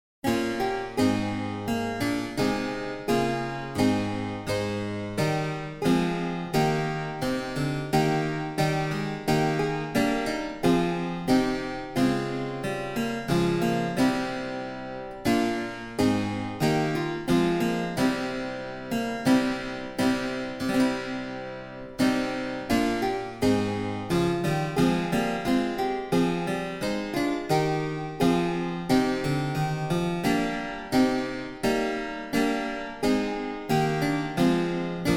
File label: German harpsichord rear 8 passametzo; German Harpsichord 1738; Rear 8 Demo; Passametzo by Elias Nicolaus Ammerbach; edition germanisches nationalmuseum